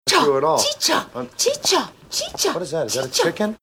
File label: chicken sounds; lindsay bluth fünke